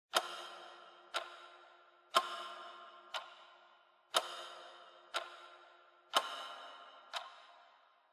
Clock Second Hand Reverb

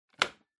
Wall Outlet 3 Pin Plug In

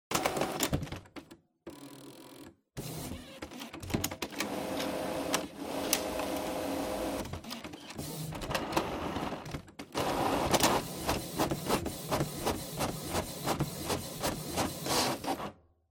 Printer Print

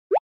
Anime Motion, Mid

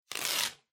Kraft Tape Peel Off

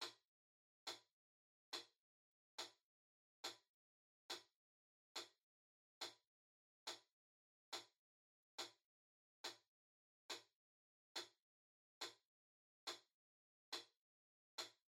Metronome Far Click Loop Slow

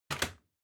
Vacuum Cleaner Head Put; 掃除機; ヘッド 置く

